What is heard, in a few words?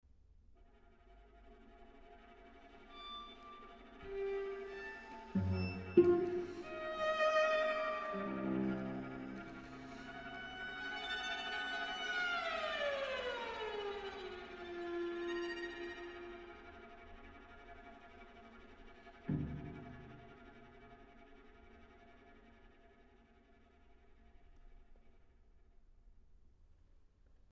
microludes for string quartet